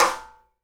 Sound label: SNARE RIM, Live Snares